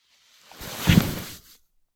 布ソファに座る音; sofa

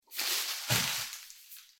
袋を床に置く音

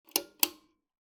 和風ペンダントライトを点ける音; light

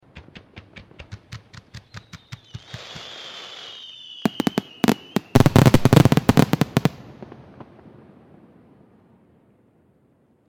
花火の音 連射; firework

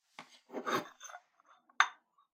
ティーカップを取る音

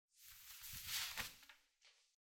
シーツの上にあるスマホを手に取る音

